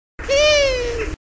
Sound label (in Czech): Asio otus, samice občas mňoukavým „mié“; hú-hú-hú; Mapování rozšíření sov na východním Bítešsku